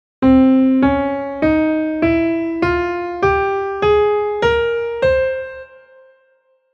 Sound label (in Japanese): スパニッシュスケール音源